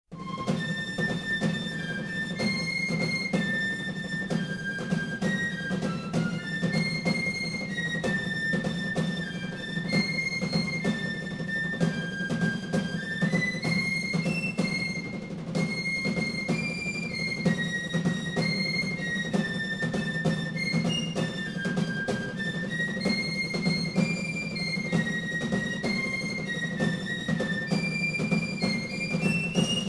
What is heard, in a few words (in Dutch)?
Tamboers En Pijpers Reveille; Reveille, 1815; De "Reveille" werd gespeeld als signaal dat de manschappen wakker dienden te worden en zich gereed moesten maken voor; Korps Mariniers